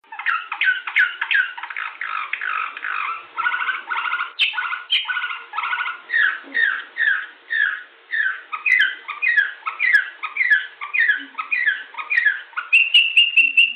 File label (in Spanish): ruiseñor balcanes, canarios timbrados